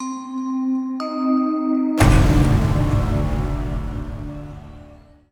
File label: Round Start Short; Buried; PS3; Xbox 360